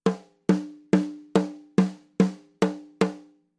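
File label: excerpt from a soundcheck, percussion instruments, top microphone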